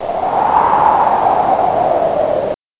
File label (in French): Vent